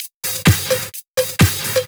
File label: Percussive Loops 128BPM; Vengeance Samples